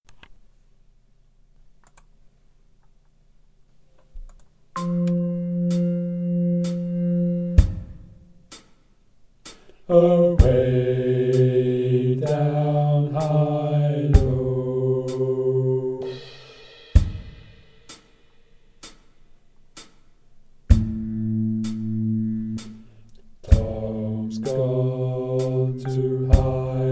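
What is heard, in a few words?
Hilo Guide Bass, sea shanty singing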